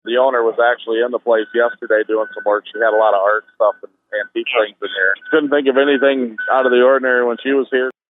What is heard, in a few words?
fire; lakepark